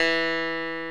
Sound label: Electric Pianos